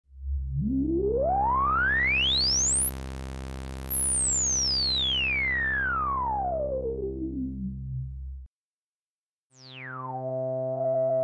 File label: minimoog filter